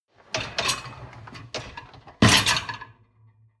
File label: dishwasher lower tray draw in